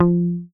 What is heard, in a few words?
HOF MUT, Hofner mute bass